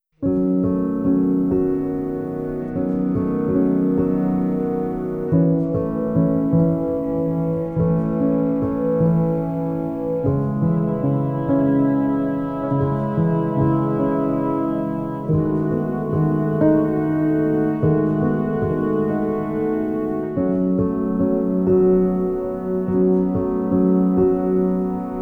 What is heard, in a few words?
Storm Passing, Piano